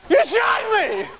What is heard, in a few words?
eeeyouch